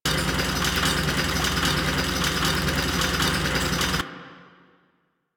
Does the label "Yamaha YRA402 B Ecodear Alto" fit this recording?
No